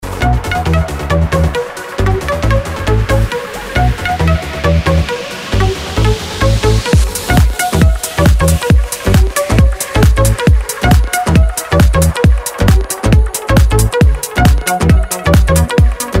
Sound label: electro house e dance